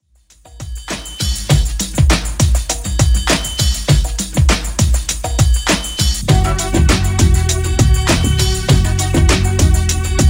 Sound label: 90s Redrum 100bpm